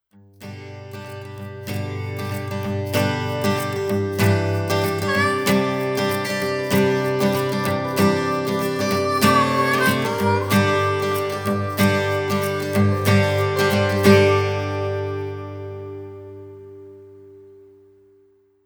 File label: intro music only